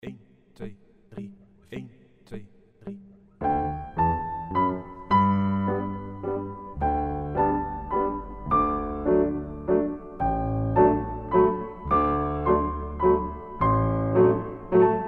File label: tune slow